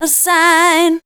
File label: voice spectral, ly short